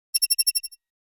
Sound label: Futuristic button